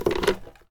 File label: bucket possess